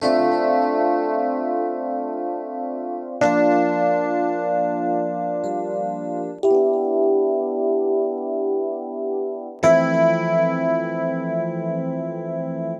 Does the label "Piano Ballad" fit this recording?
Yes